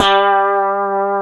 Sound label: G 3, Guitars; Spectrasonics; Hans Zimmer Guitars VOL-1; 90's Sample CDs; COMP POP+VIB